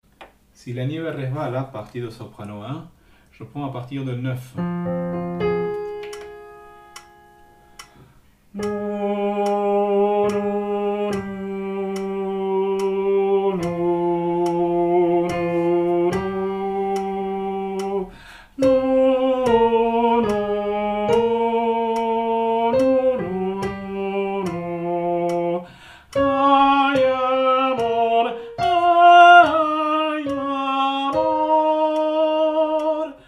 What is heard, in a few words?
Soprano